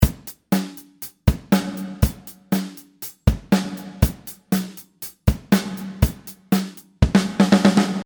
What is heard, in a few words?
Snare; reGate